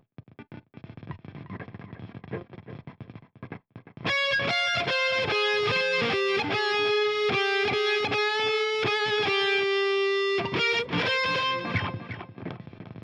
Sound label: Guitar